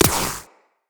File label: Shield Impact; Tenno